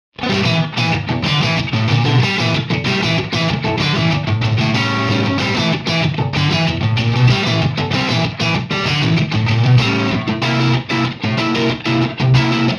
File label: strat marshall 100w